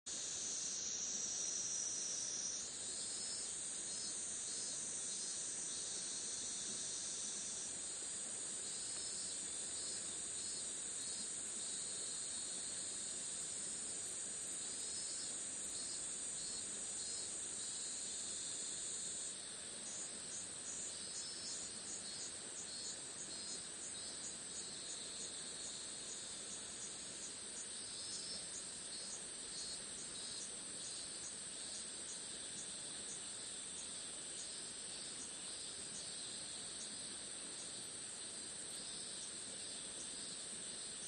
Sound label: riverside; Kyoto U; Ashiu